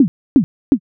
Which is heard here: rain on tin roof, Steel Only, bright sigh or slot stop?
slot stop